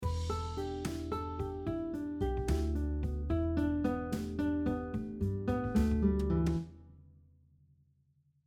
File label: Scale pattern 2, 4 note E minor pentatonic scale pattern, guitar; over the same chords as the previous example